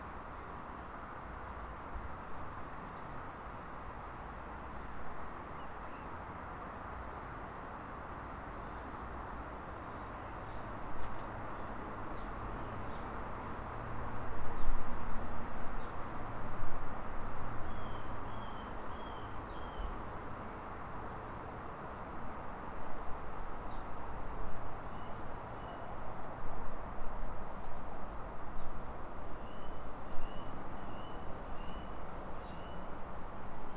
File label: distant; OpenBVE; Railway; Jubilee Line